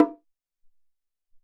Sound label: Bonga, Alesis SR-18